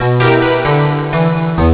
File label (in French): clavier; intermèdes musicaux joué par tout styles de claviers (piano, orgue, piano electronique etc...)